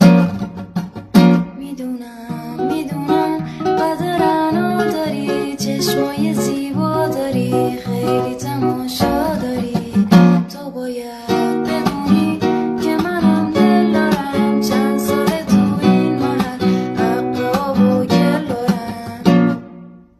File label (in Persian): Ba Sedaye Zan